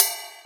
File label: Ride; FLPRO